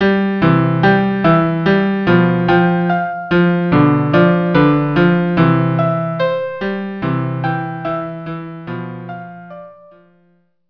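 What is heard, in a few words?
cello piano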